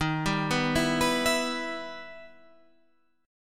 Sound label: E Minor Major 7th Eb